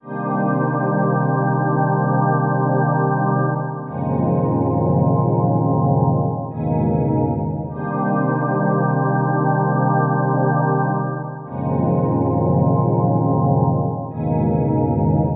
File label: 125 moody thin pads; SYNTH AND ELECTRONIC LOOPS